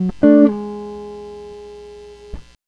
Guitar, Trip-Hop Loops

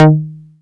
MINI PLK EB3, MINIMOOG; Big Fish Audio - First Call Vintage Keyboards

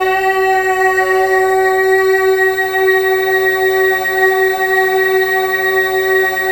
MALE EE F#3, SOLO, Africa; Spectrasonics; Heart of Africa 1

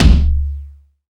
Fat Boy Kick; Drums; Kicks

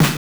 Snare, Genesis; Terminator 1; M8

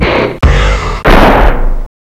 EFX PERCUSSN; Rubber Chicken Software Sample Bank

